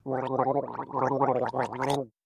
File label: Gargling